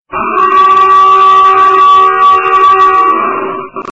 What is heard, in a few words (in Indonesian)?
Klakson lucu